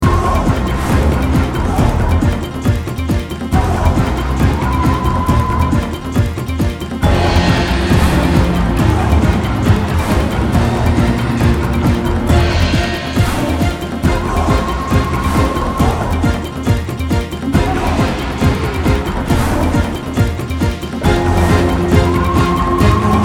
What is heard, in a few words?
in battle; Chariots of War